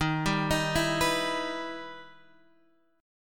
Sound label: E Minor Major 7th D sharp